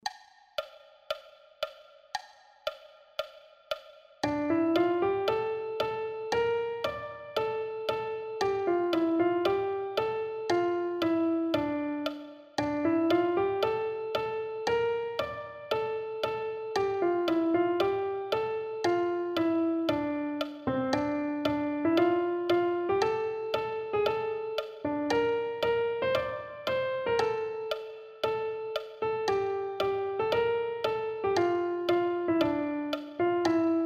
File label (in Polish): orkiestra marsz melodia z klikiem; orkiestra koncertu niepodleglosci